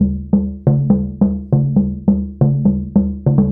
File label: Djembe; Sonic Foundry (Sony Creative Software); Essential Percussion; Drums